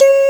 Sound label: Doo Eb3